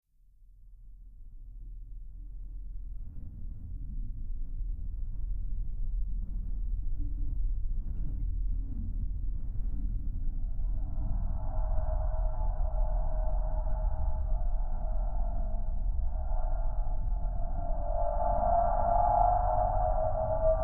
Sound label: Underwater Ambience Metal- Ambient Metal Singing Scrapes